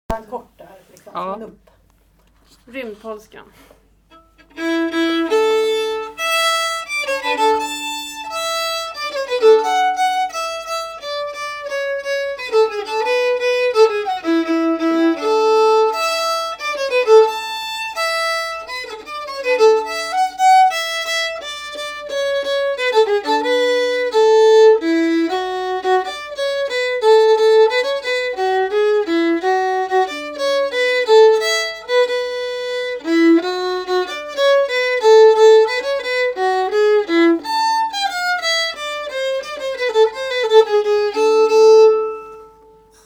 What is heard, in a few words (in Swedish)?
Rymdpolskan